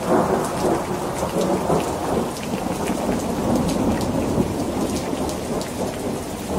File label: Thunder Storm Sounds